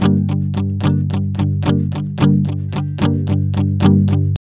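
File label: ROCK F sharp minor 110, Vintage Blues Guitar; USB Soundscan vol.22; AKAI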